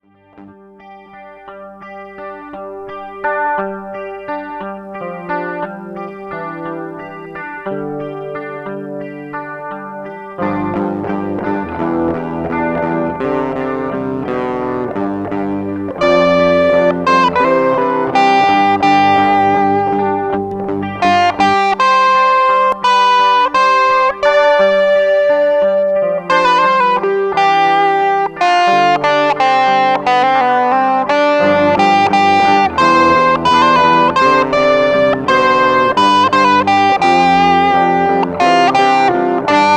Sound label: Loop